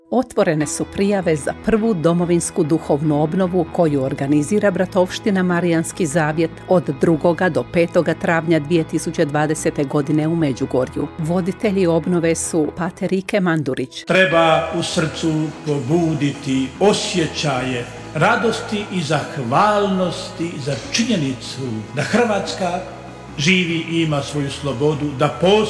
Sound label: jingle; Prva domovinska duhovna obnova